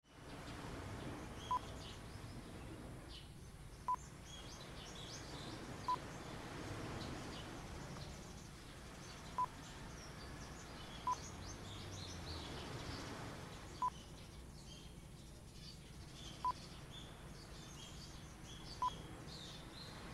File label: Sabang Beach Ambience Philippines